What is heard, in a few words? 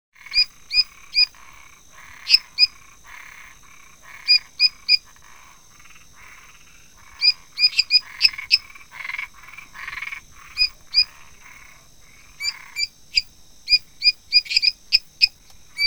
Dendropsophus minutus pererequinha-do-brejo